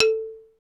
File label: XYLO; Roland - LA Composer vol. 1; STANDARD PRC